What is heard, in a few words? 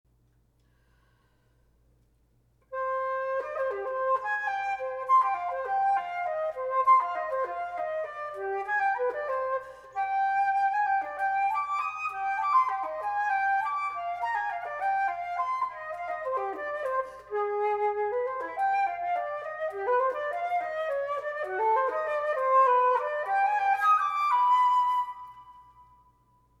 Flute Technical